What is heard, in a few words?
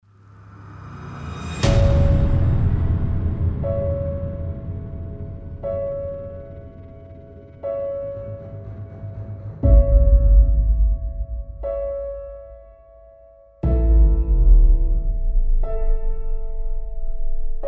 bass; Ukázka filmové hudby.